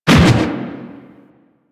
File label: Fist Whoosh; DE; Warframe; Weapons; Archwing; Melee; Grn Arch Regor Fists